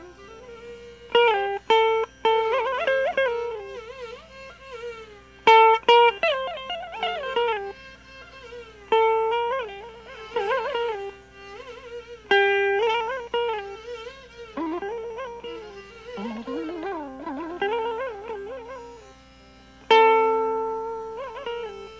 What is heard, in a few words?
Bahudari end rhyme N P, ālāpana; End-rhyme on n/P in Srinivas’s Bahudari ālāpana